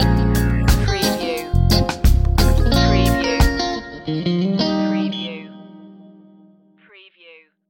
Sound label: soul of reggae stinger